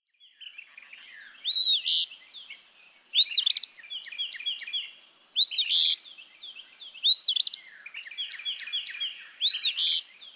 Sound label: Eastern Phoebe